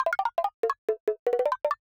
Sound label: Random Blocks; musicradar; cartoon caper